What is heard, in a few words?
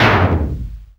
METAL ANYTH; AMG - Vince Clarke Lucky Bastard